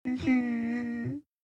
Scared Whimper

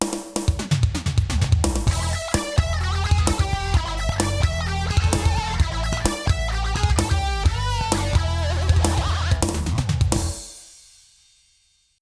Continue; Final Fight CD

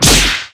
Ball Hit Drive

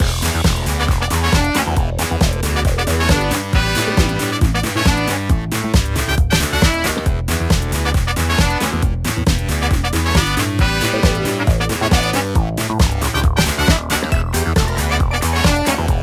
Boom City Boogie Loop

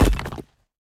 Weapon Stone

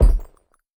Movement

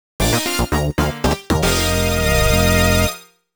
Mission Success; Mario Kart DS

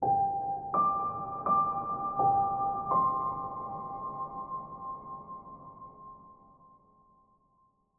Success Phrase; Planet of Lana; Rakuenpuzzle

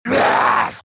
Monster Yell

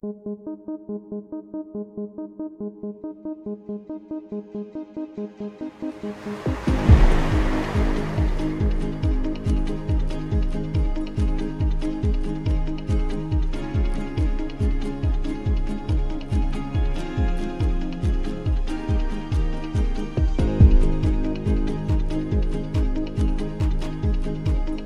Opening Cutscene Music

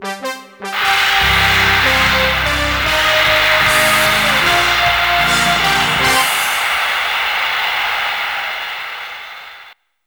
Goal; super sidekicks 2 the world championship; Neo Geo CD

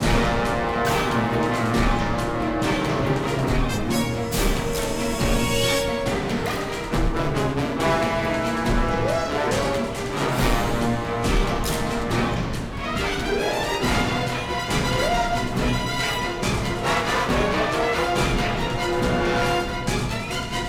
Combat; The Hobbit; PS2

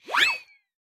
Coffee Puddle Slip

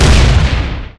Enemy Flame Skill Hit

